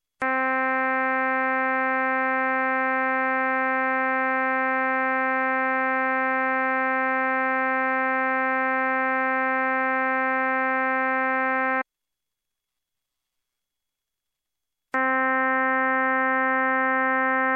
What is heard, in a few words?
Trumpet mid C anechoic